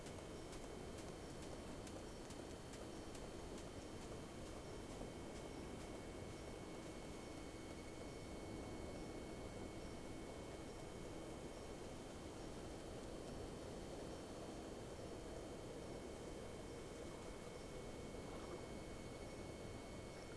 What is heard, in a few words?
Whales; SeaSnake